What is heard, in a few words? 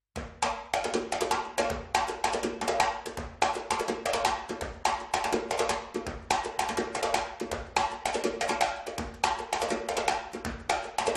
timbal